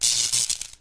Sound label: elec